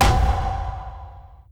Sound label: stamp